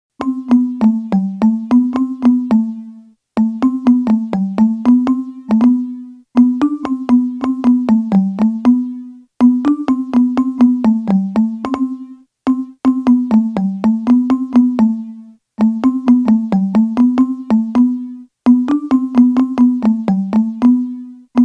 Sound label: melody